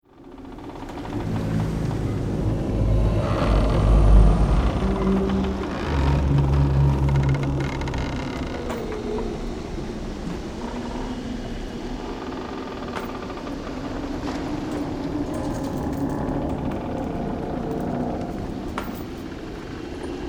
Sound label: ambient